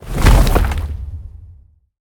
nudge Large; templeofnudges

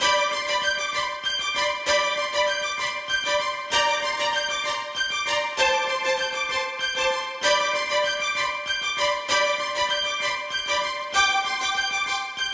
mega Win Loop; temple of nudges

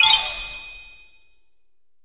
candy Fall Med; fairyhansel

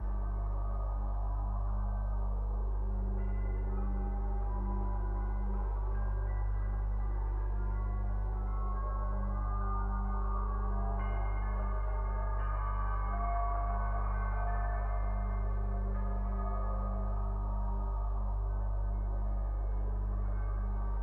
ambience Music; templeofnudges

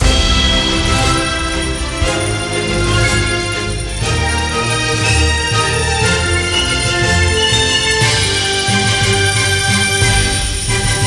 Music Bigwin coin; godsoffortune

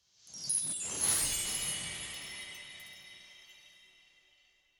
feature Splash Change; fairyhansel

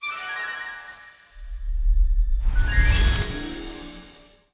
random Symbol Transform Start; fairyhansel

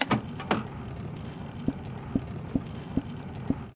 spin Button Click; jack and beanstalk

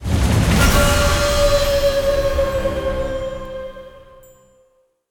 board Total; jumanji

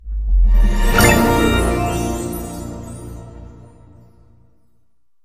candy Win Reveal Low; fairyhansel

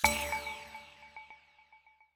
quick Stop Free Spin; fairyhansel

